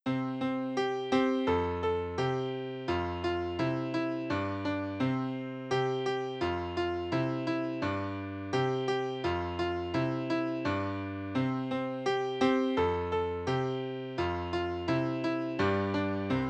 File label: twinkle twinkle little star variations